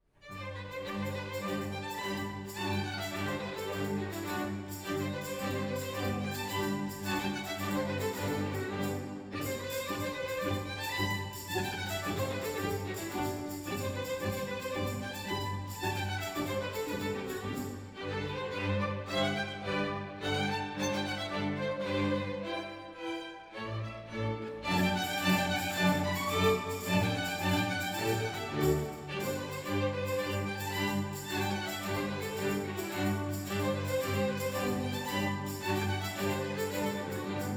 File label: tambourin